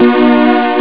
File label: st chord; Amiga Soundtracker Sample Packs